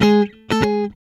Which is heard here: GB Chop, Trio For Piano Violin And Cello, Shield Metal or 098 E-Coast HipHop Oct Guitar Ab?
098 E-Coast HipHop Oct Guitar Ab